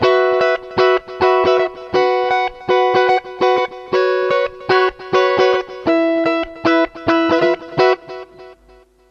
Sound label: strum, guitar